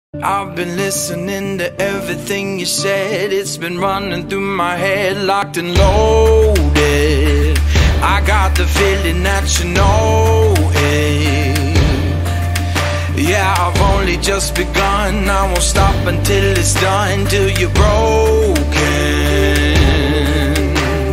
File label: bgm ringtone emotional bgm; 777 charlie